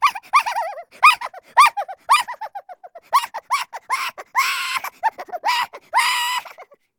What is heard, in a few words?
Cartoon Little Laughter